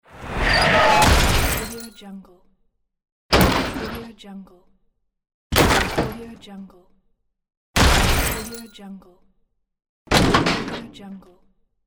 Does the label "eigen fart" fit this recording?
No